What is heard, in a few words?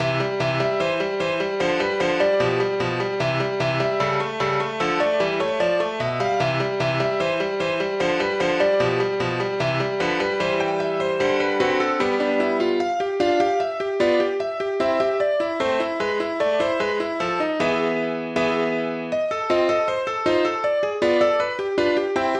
String Quartet No.13 D.804